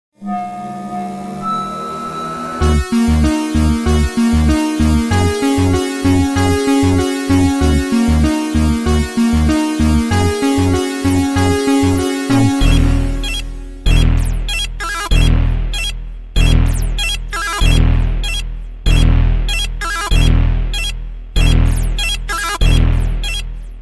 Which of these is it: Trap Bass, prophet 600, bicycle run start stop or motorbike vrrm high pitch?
prophet 600